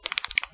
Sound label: Knuckles Cracking